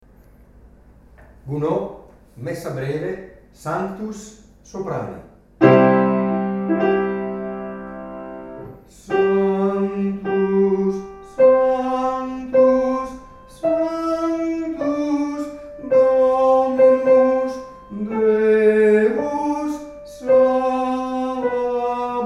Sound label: Sanctus Soprani